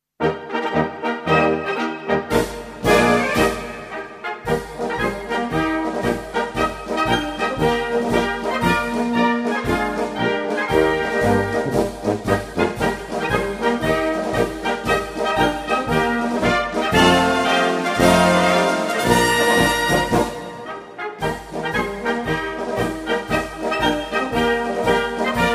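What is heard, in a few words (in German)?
Original österreichische Blasmusik, Marsch; Hohenauer-Marsch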